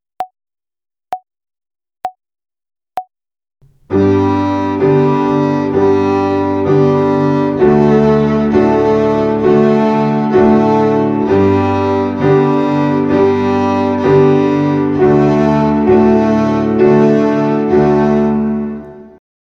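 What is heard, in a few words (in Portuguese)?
Ginástica para o dedo 1 - Corda SOL - Quaternária, Violino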